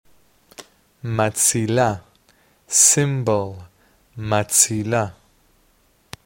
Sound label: Cymbal